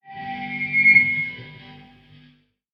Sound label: Nice High Squeal Burst